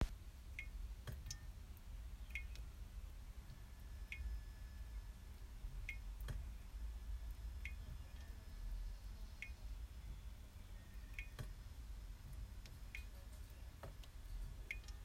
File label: Defrosting, Defrosting Freezer